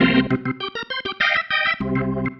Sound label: Single Riff, Organ